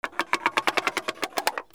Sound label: BOATS